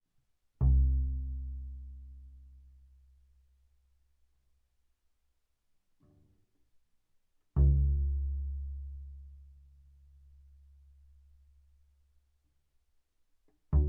Bass pizz sul A ff C2 B2, double bass

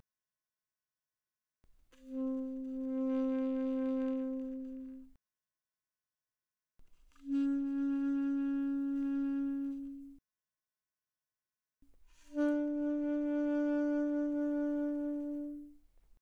Alto Sax Vib pp C4 B4, alto saxophone

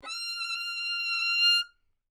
Violin arco ff sul A F6; MIS Pitches - 2014; Strings

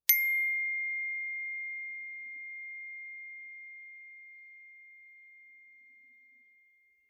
bells brass ff C7 stereo; Percussion

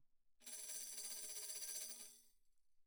tambourine shake roll pp; Percussion

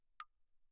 clave, hand percussion; claves

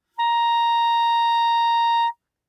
Bb Clarinet ff Bb5 stereo; Woodwinds

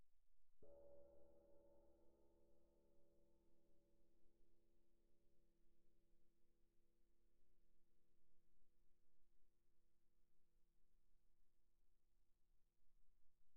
crash mallet pp, suspended cymbals; Percussion

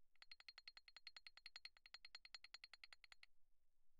xylophone rosewood roll pp C8; Percussion

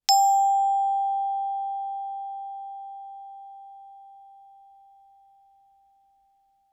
bells plastic ff G5; Percussion

